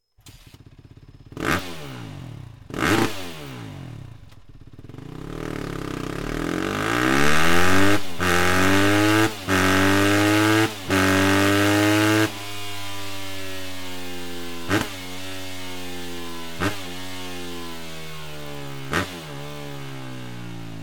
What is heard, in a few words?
yz250f stock